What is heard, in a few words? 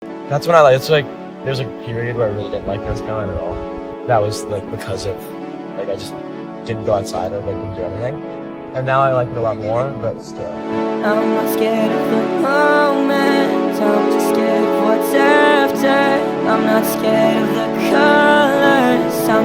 music